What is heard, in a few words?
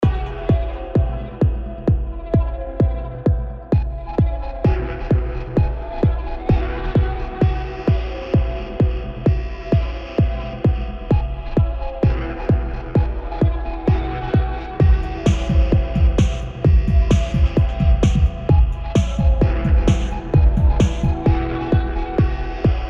a flick loop